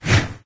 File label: Sign Falls In; Candy Crush Saga